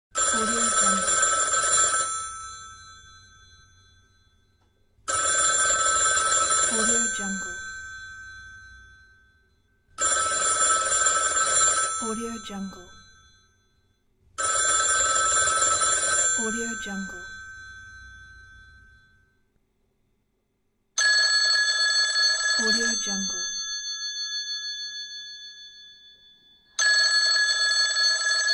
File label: rotary phone ring